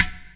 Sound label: clay pot hit